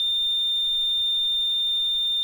heart monitor; Golden Crayon Sounds

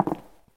tile land; Original TK Sounds

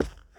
carpet step; Golden Crayon Sounds

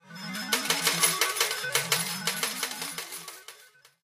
jackpot, Slot Machines; RS Games Sounds

